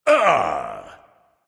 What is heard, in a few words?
"ah", discover; smite; voicepack; ullr